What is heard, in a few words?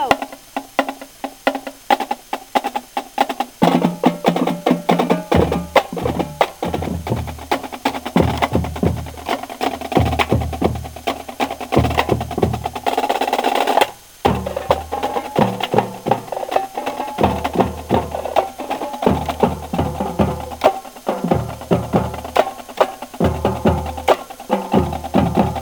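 drumline cadence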